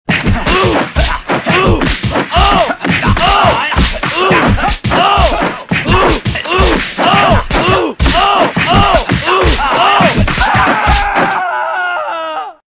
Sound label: severe beating